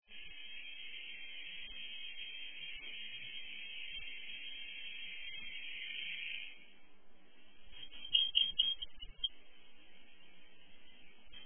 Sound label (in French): bruant des roseaux, chants et cris